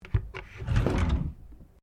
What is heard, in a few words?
Drawer Laminate Small Full Open; Various domestic drawers; Household